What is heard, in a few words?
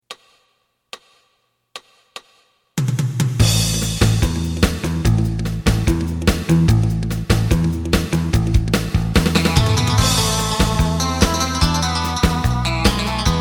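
side guitar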